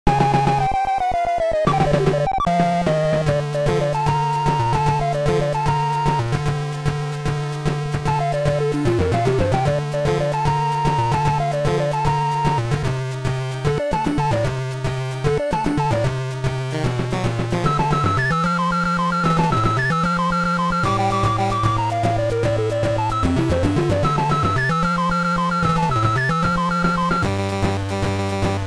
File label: Boss Combat Theme; just breed nes